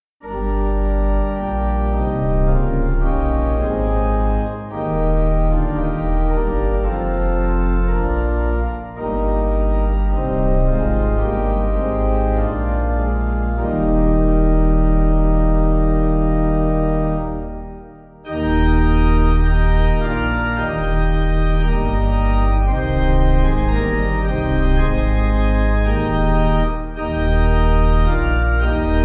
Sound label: Pipe